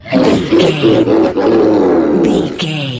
troll monster laugh

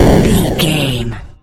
retro explosion deep

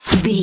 bullet impact snow

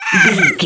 goblin fairy hurt pain choke

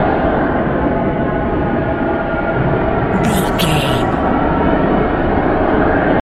dark portal wind loop

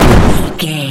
explosion small no tail

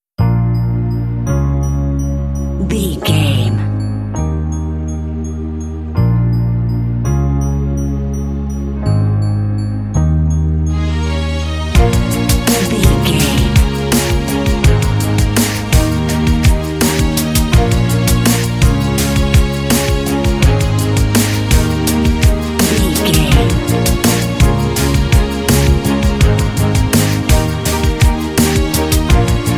sexy lounge spy cinema alt end

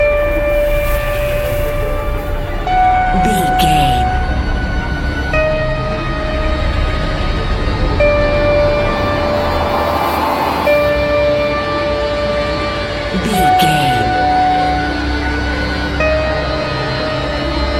modern horror; Video Game

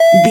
retro alarm siren loop

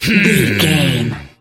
voice male growl

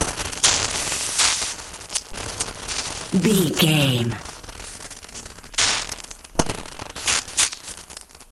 elec lightning magic arc loop

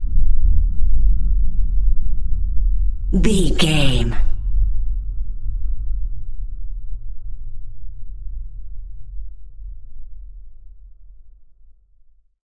sci-fi sub bass rumble

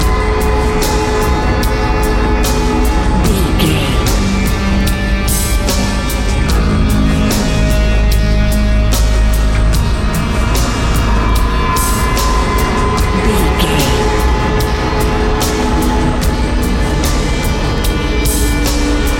harvest nightmares

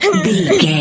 voice female effort quick action

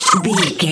goblin fairy attack special

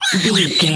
voice fun creature small mutant voice emotes

